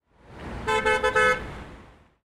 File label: car honking intensively